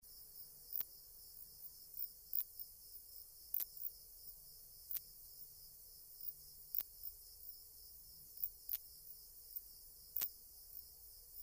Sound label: Phaneroptera nana; BIOAC ORTH